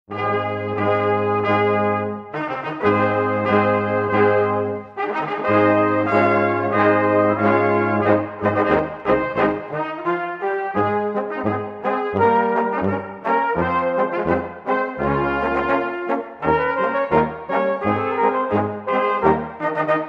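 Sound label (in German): Begrüßungs Marsch, volksmusik; Haag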